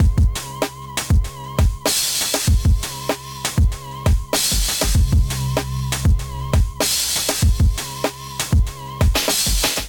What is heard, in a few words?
Beat 88 Bpm, Drums Complex; MIDI Clips; Around the Corner; Ableton; Chop and Swing